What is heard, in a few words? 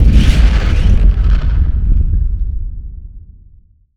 large explosion